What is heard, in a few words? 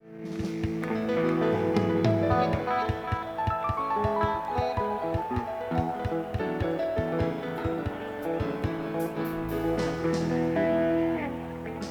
Tuning